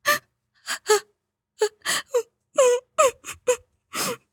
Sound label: moan; Devour